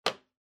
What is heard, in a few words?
Tablet PC Put Table